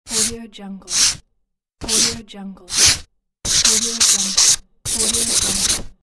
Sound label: marker on paper; circutreeaudio